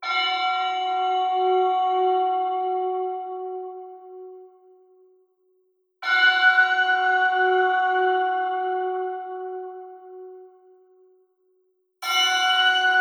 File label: LEAD; Legend of Keepers